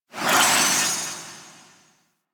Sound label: Alchemist Transmute Ability Loop End; Warframe; DE; Warframes